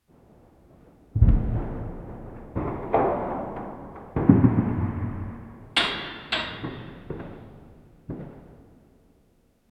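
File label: blacksmith hammering metal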